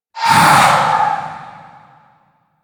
Specter Vocal Attack; Enemies; Warframe; Priest Quest; Rell Specter

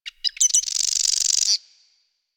Forest Bird Squak; Ambience; Warframe; Grineer Forest

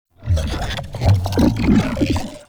Infested Shotgun Idle One Off; Weapons

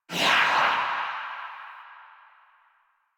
Choir Dash; Warframe

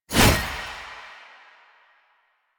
Gunbrella Aim Transition Out, Weapons; Warframe; Tenno; Koumei

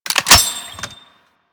Glass Shotgun Reload; Warframe; Tenno; Weapons

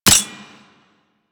Anti Aircraft Gun Mech A; Weapons; Warframe; Archwing

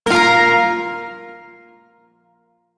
Shawzin Chromatic Chord K; Warframe; Duviri Shawzin

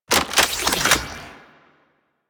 Hook Sword Equip; Warframe; Weapons; Archwing; Melee

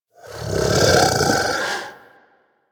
Kubrow Vocal Snore; Warframe; Hunting Critters; Orokin Kubrow

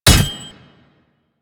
Ghoul Devourer Melee Impact; Warframe; Grineer Ghouls

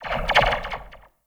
Zipline Random Slow; Gadgets; Parkour Zipline

